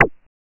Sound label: Moog BL; Moog Modular55